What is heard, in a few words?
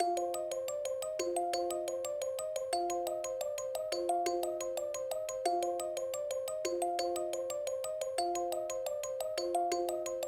Underground Night, Underground Theme; SMM2; SMW